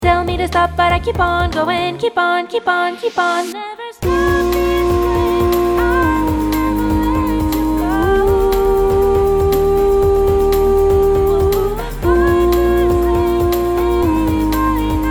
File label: Soprano, ensemble vocal pop rock; ensemble vocal pop rock de larquemuse